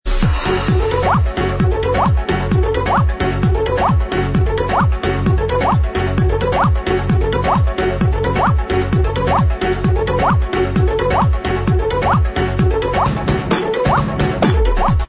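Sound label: instrumental, track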